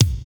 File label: KIK BRYT, Dance Kicks; Roland L-CDX-01